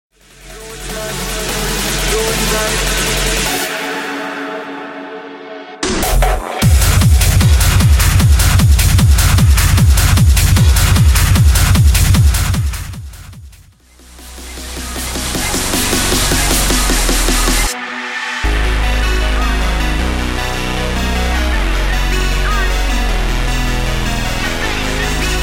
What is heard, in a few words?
HARD TECHNO